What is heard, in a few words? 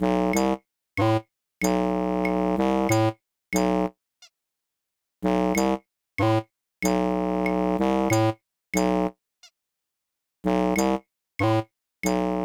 Stung by Bees